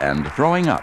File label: throwing up; actions